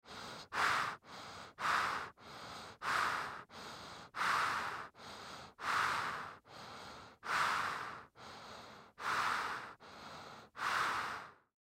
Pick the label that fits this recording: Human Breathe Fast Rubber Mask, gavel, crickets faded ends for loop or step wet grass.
Human Breathe Fast Rubber Mask